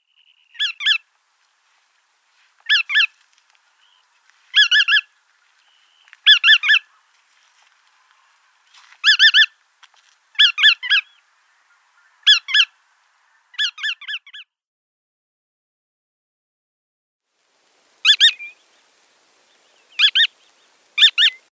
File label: Bar-tailed Godwit calls